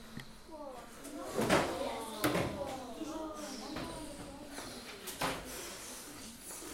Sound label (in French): soupirs